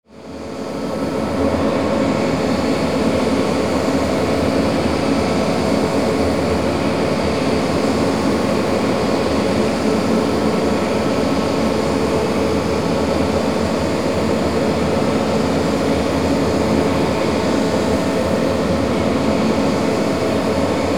Drone Dark Industrial Airy Filter; Blastwave

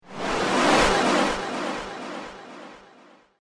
Swipe, whoosh, science fiction; Way Past The Future

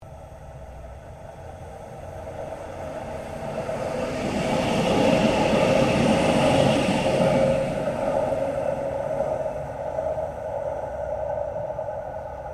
Tram Melbourne C Class Passing Slow; Various Melbourne trams; Streetcars; Vehicles

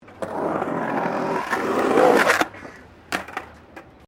SKATEBOARD SKATE BOWL THROUGH BOWL; Skateboard sounds; Skateboarding (Sound Effects:Sports)

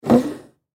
Foosball Table; Foosball Table Players Double Move Light; Sports

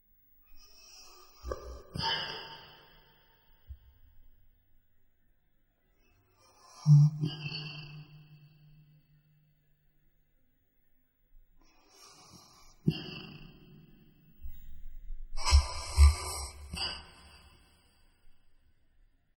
Alien Breath; Alien Creatures; Aliens

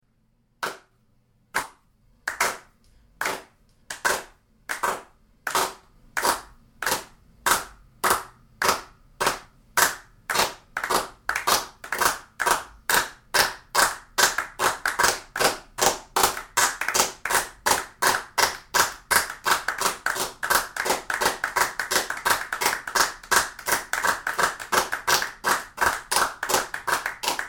Applause Small Crowd Clapping In Sync; Few people clapping together, starts slowly and speeds up; Applause Sound Effects

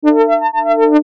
Chime Musical, Chime Musical Multimedia; Synth; Multimedia Web Interactive; Chimes; Blastwave

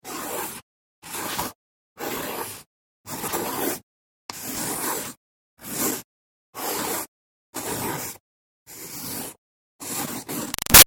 Pencil Strokes Long On Cardboard

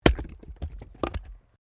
Wood Chopping Axe; Objects

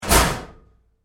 Door Locker Close Roomy Hard, Metal Door; Metal Doors

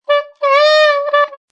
Sax Complain, A saxophone complains; Funny Musical; Sound Effects:Musical

